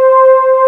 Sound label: MOOG SOFT VOX, SYNTH; AKAI S-Series CD-ROM Sound Library VOL-2